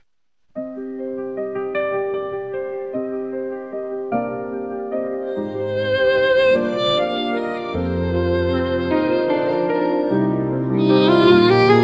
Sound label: cinematic piano violin